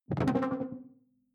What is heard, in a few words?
sci-fi alien bounce detuned; swooshes-swishes